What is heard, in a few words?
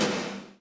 vote yes